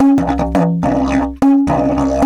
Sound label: 108, Didgeridoo